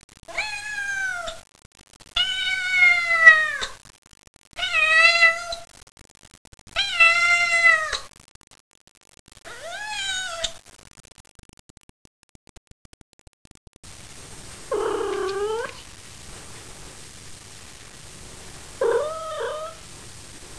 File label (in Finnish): kissa; suomenkielistä